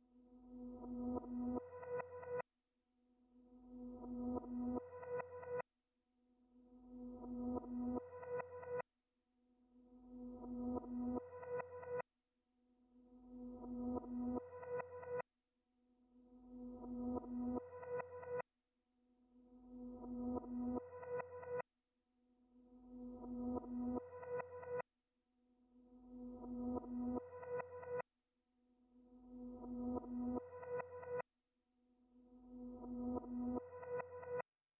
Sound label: 150 BPM G Maj Pluck; Reverse; Loop Stems; Cymatics; 2022 Melody Collection; Wishing Well